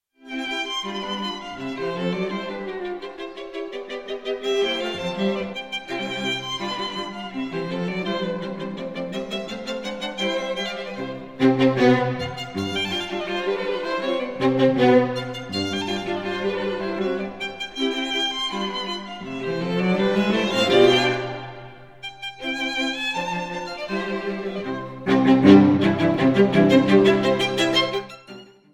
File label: string quartet; wolfgang amadeus mozart; no 19 in c k 465; dissonance; allegro molto